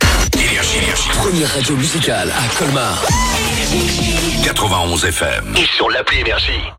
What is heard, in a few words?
JINGLE